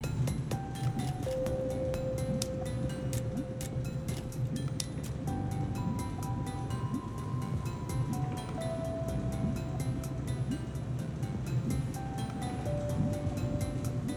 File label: reduced percussion; mcsm music; Netflix